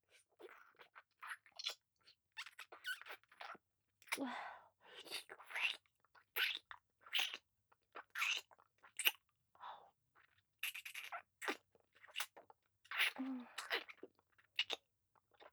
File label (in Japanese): 乳首舐め（バードキス）; 乳首舐めループ